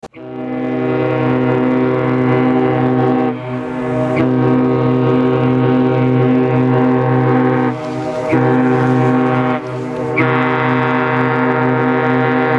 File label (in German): typhon, nordnorge; postschiffreise